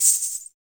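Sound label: jingle bells; Roland LA; ETHNIC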